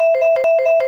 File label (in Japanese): pinpon; チャイム３; 高速ピンポン ループで狂気のピンポンに